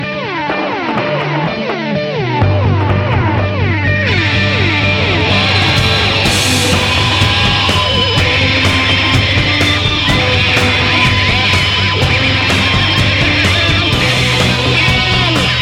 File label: solo; соло